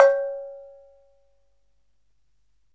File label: CANANG C#4; Spectrasonics; Heart of Asia